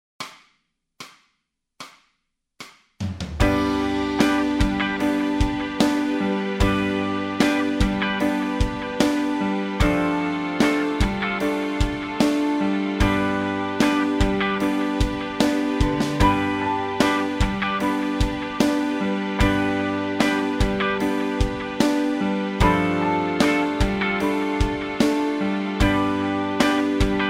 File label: bass scales, backing tracks